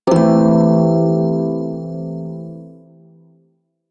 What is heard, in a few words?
Prime Shawzin Pent Maj Chord A; Music; Warframe